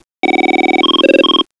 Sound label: ring pattern